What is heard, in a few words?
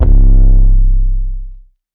808 C; Advent Calendar - Day 9 - 808s